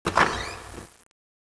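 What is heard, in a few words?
ambient, halloween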